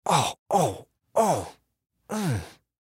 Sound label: Human Moan; Moan Painful Short Multiple Male Fifty Yrs; Blastwave